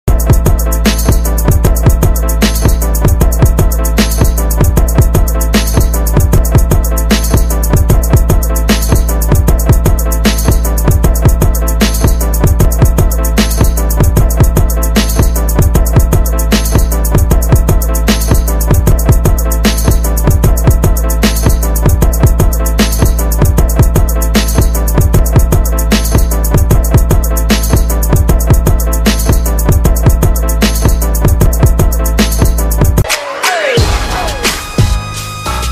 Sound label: east side flow instrumental ringtone